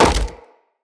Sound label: Hammer Impact Wood; Weapon Impacts; Player; Environment; Tuvalkane Hammer